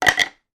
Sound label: household beverage coaster wooden drop on table; sound effects one